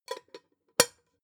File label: pot lid close plop; objekte; topf